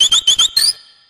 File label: cry